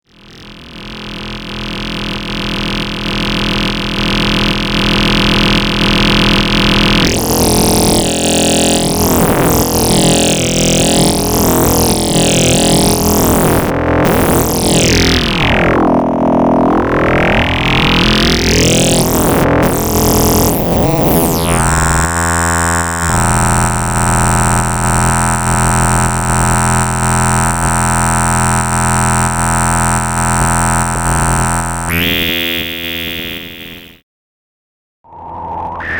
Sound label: micromoog